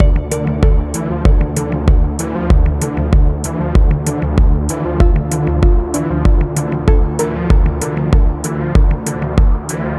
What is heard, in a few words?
loop; virush windows gamerip 2017; tunnler